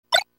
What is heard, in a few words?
item pickup; brawl